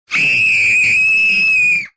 Cri de fille

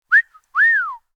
Siffler

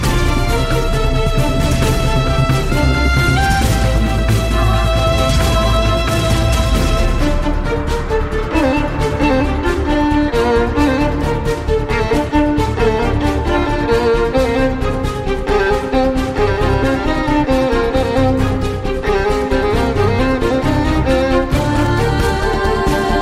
Violon; instrumental

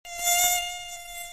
Moustique

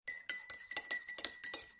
Tourner cuiller café

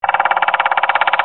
Pivert

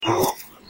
Kangourou, notification

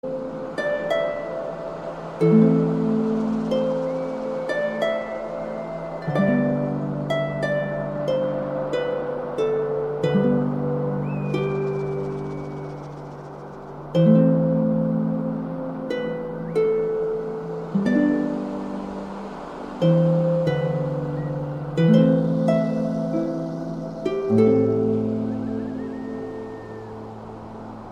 Harpe; instrumental

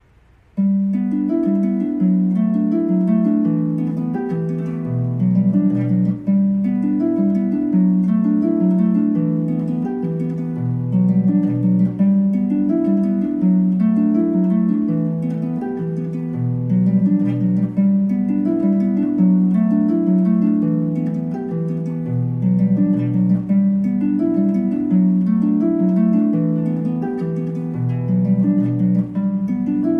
Harpe; instrumental

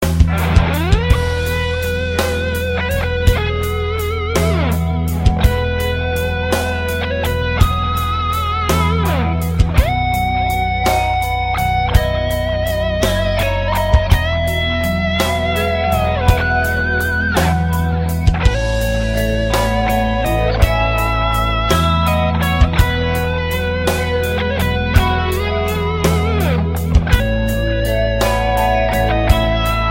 Guitare Rock Christmas; fetes

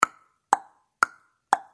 Tic Toc

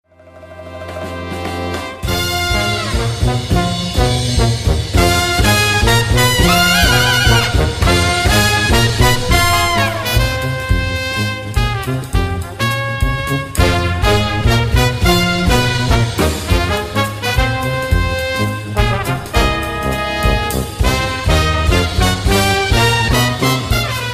Trompette; instrumental

